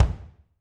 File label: BD, Drums; Bob Clearmountain Drums I